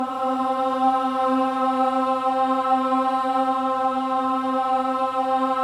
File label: BOYS AHS, Symphony of Voices